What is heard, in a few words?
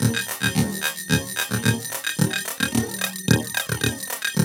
LOOP, TECHNO; TECHNO-BOX; ELEC-LOOPS